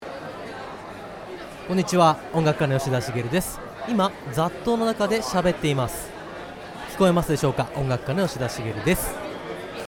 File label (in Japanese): ノイズテスト